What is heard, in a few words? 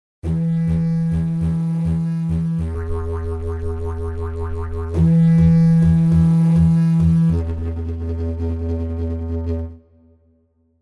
south pacific vibes didgeridoo; chiqui audio